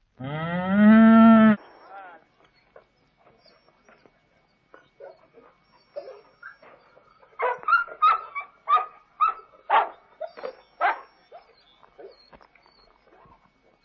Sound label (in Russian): korova i sobaka